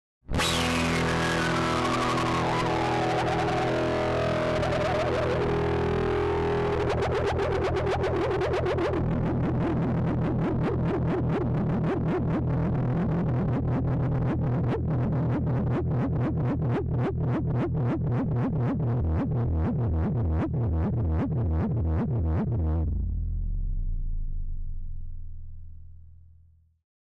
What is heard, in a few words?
Chord Plummet TubeZipper; J Friedman